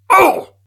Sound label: Pain; awesomenauts